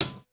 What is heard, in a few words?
HAT, Hip Hop